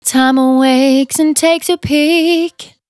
Finally 70bpm C, Velvet Vocal; Zero-G

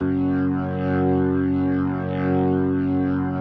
B3, First Call Vintage Keyboards

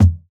Kick, jungle breaks drum sounds

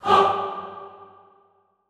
HAH G2, Classical Choir, SHORT HAH; Best Service

